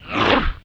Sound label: Monster Zombie type Death; Inotia 3